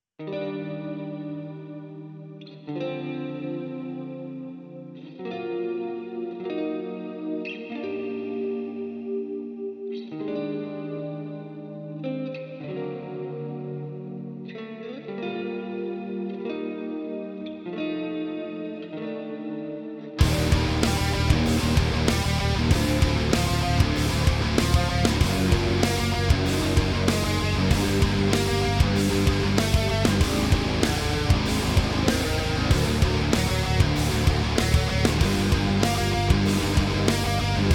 Lullaby

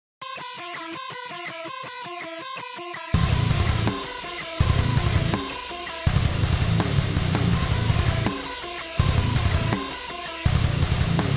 Knocked Lead

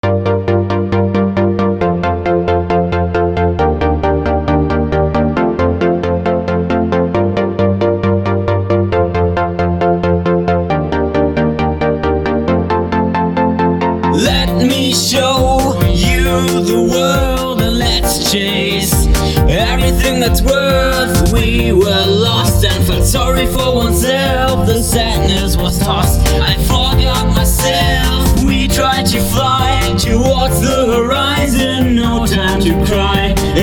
pop

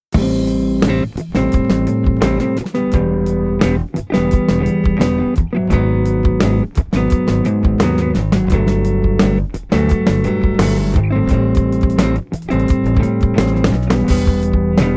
Weird Jazz

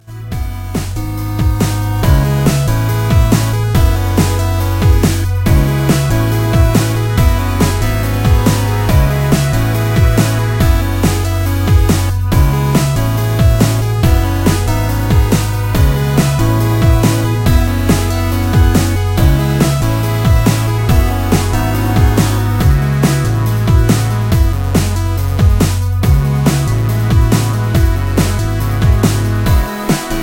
Ringtone

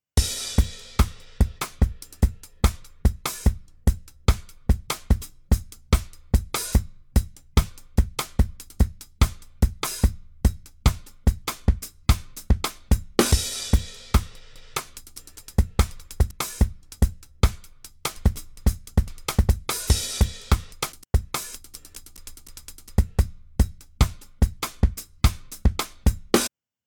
Wild Stuff Drum

